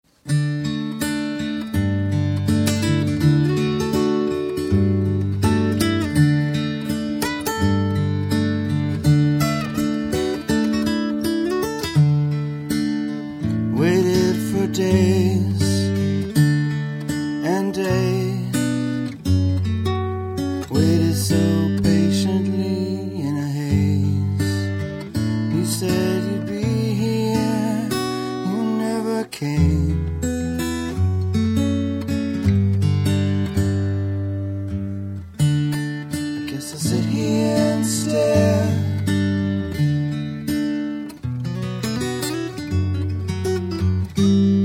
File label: drums